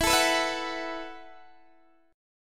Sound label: E Suspended 2nd Suspended 4th E, down, Guitar, DADGAD